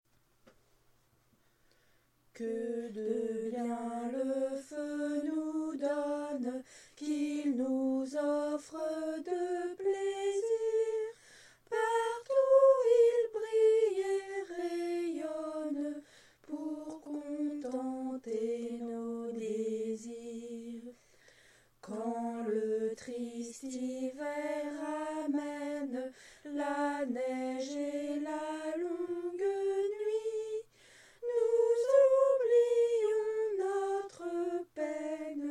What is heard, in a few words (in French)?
chant; Le feu